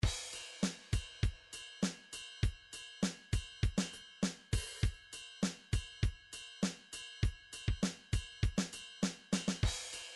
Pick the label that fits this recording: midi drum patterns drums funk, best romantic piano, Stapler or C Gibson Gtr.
midi drum patterns drums funk